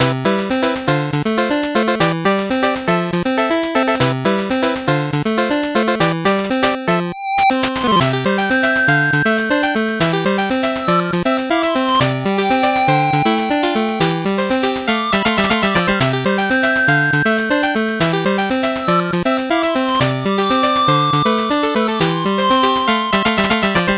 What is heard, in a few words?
8bit bgm normal